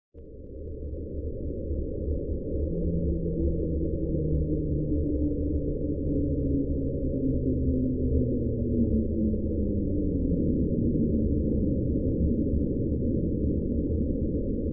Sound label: underwater, ambient